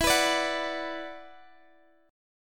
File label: E 7th Suspended 2nd, Guitar, Standard; E7sus2; strummed; x,x,14,16,15,14; down; capo=3